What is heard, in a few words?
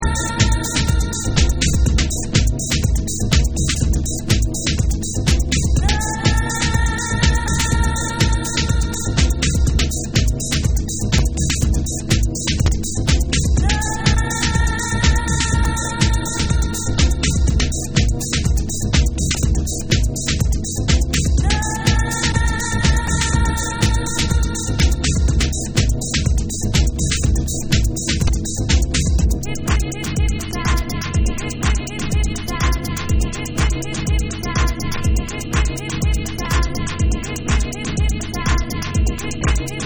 Trance Mix